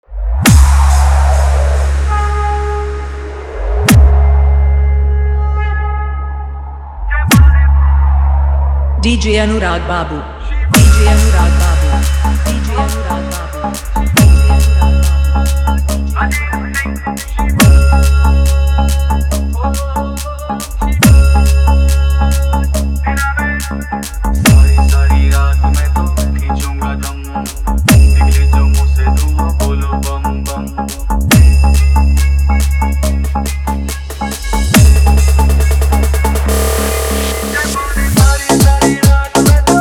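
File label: Hard GMS Bass Full Sound Check Competition Remix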